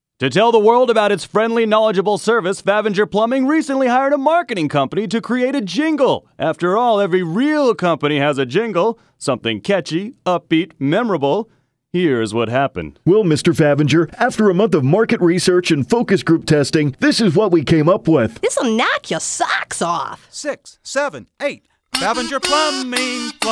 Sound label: Jingle; Favinger